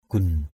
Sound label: gun